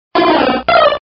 Grito de Spearow

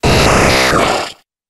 Grito de Abra

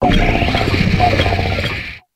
Grito de Ferropolilla

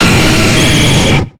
Grito de Nidoking

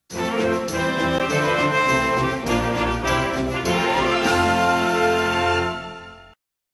Tema de victoria; Donkey Kong; SSBM

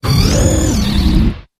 Grito de Stonjourner

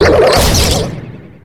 Grito de Ferrothorn

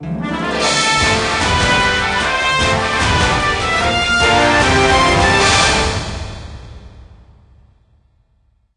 Tema de victoria; Super Smash Bros.; SSB4

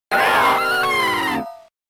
Grito de Dialga

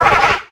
Grito de Whismur

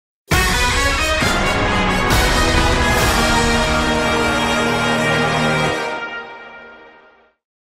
Tema de victoria; Ridley y Samus Oscura; SSBU

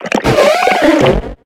Grito de Lickilicky

